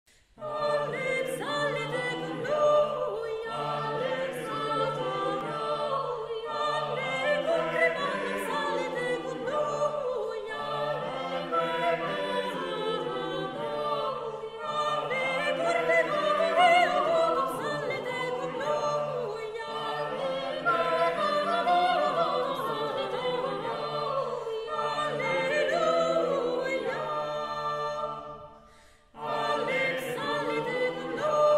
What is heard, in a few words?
Alle psallite cum luia